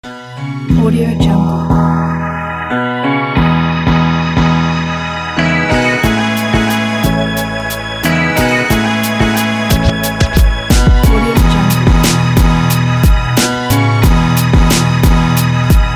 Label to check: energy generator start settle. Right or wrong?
Wrong